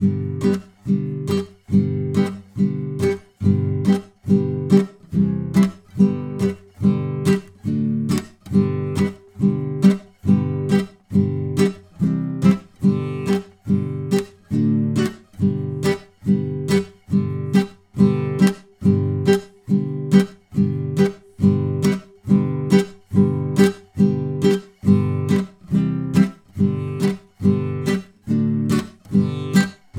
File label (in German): Gitarrenbegleitung